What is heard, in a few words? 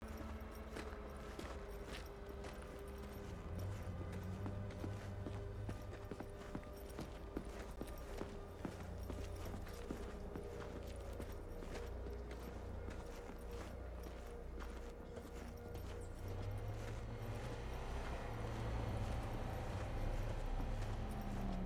audio sample walking through santo domingo; Cyberpunk 2077